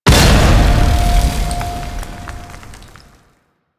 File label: Heavy Slam Ability Slam; Warframe; Duviri; Dax